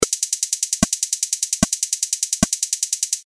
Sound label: HI HAT PATTERN